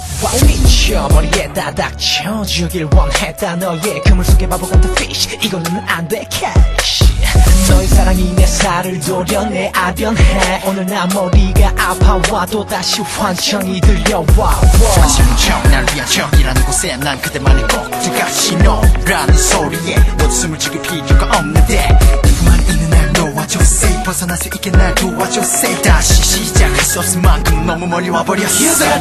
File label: rap